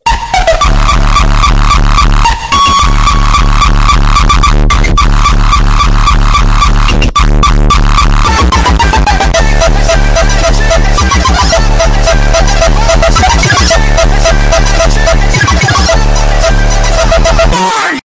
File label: hardstyle